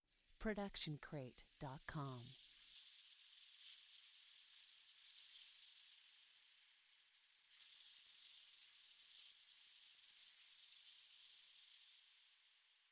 Magical Portal Shimmer; SoundsCrate

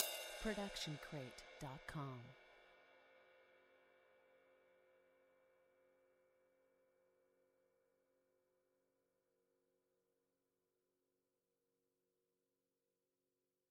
ride cymbal 20 inch; soundscrate

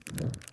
torch swipe; soundscrate

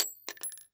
bullet shell drop

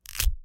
Bone Crack; SoundsCrate

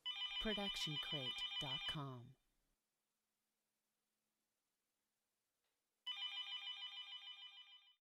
Phone Ring Twice

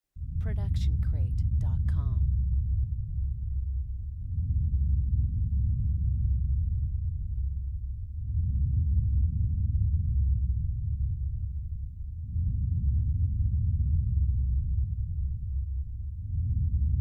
Thunder Rumble; soundscrate

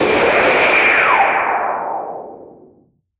Anime Super Blast Off

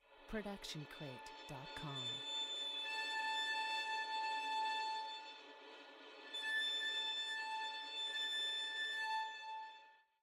Violin Airy Scratch Harmonics

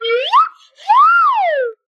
slide whistle good lookin

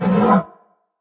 monster roar short; soundscrate

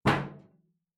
Metal Footfall; soundscrate

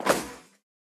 bullet impact woosh

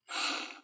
drill rev; SoundsCrate

